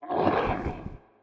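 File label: lesser demon damage, monster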